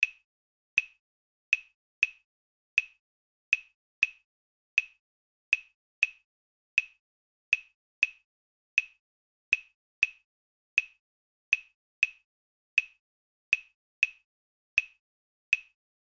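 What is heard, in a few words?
tresillo, drums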